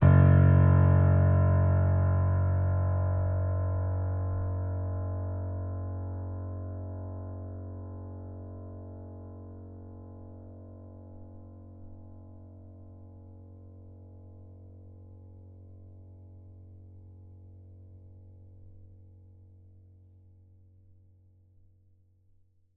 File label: F sharp 5, piano